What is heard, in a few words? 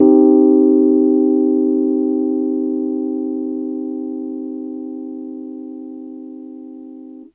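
Piano Rhodes minor soft; TrashLibrary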